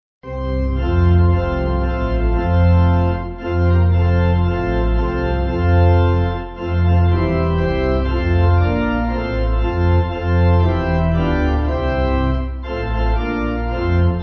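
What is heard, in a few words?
Pipe Organ, Music Backing; Rejoice And Be Glad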